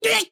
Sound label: Damage